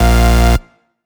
Bass Cuts; Vengeance Samples; VES1 Instruments

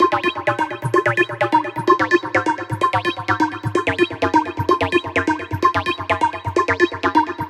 Special Sounds Root A# 128 BPM; Vengeance Samples; VEH3 Special Sounds

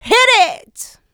voc, female vox, Vocal House

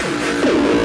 Fill 140 BPM

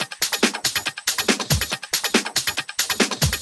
Loop 140 BPM; Vengeance Samples

